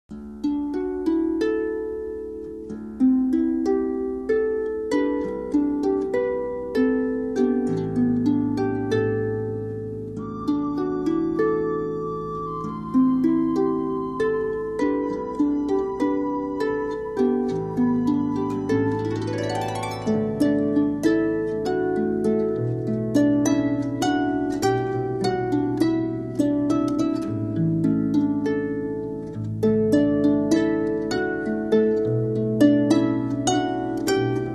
Harp

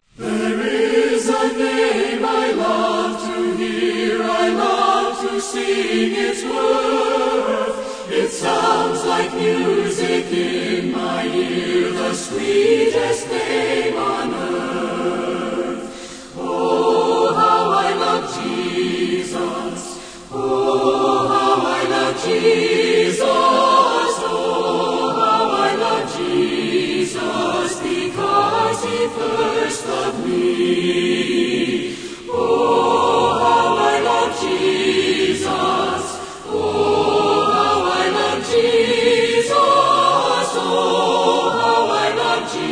Choir And Orchestra

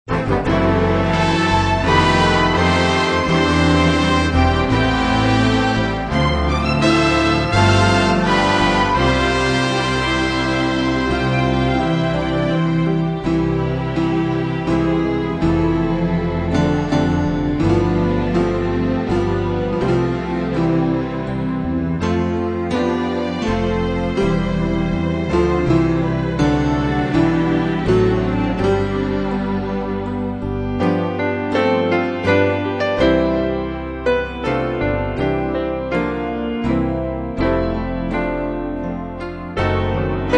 Instrumental Hymns

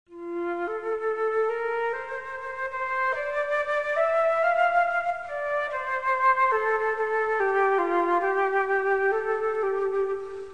Philharmonic Orchestra + Choir

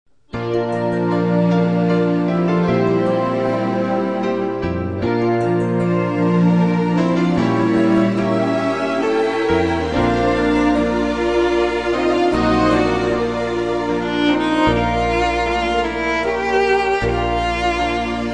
Instrumental Praise

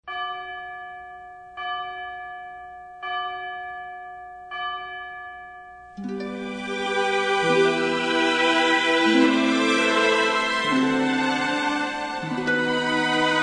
Orchestra, Strings

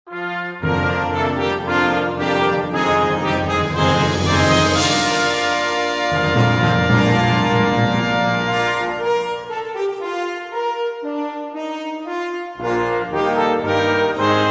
Brass Choir

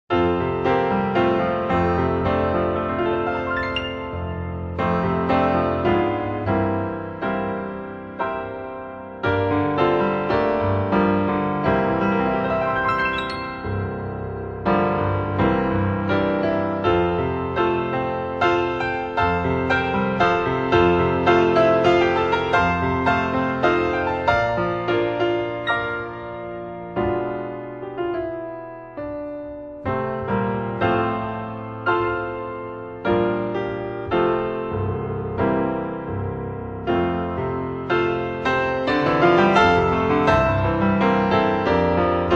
Keyboard

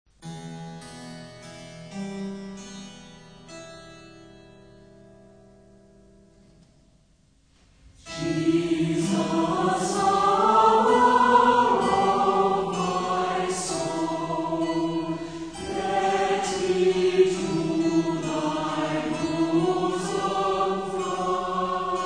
Orchestra and Choir, Hymns

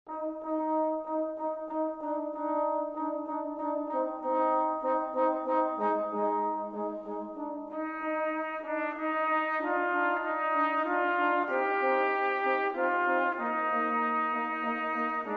Brass Choir

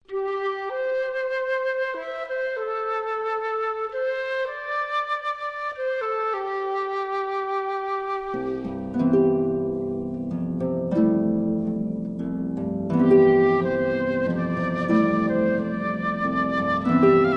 Singers And Orchestra

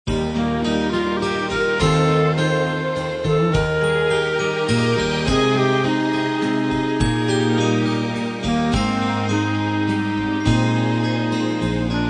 Instrumental Hymns

